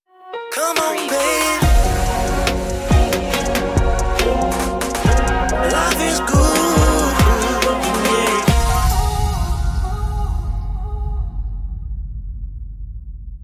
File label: smooth soul vibes stinger